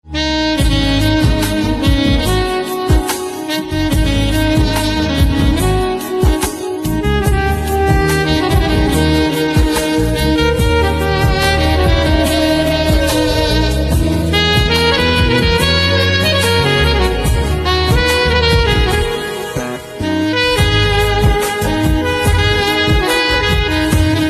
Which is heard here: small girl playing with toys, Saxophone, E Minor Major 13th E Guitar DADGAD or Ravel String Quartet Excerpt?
Saxophone